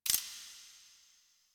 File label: Choice Hover A; DE; Warframe; Winematic